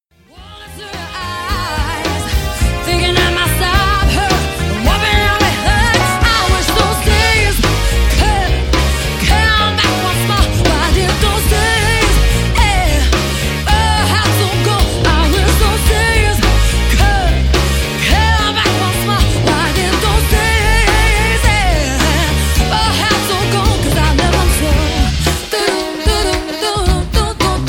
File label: soul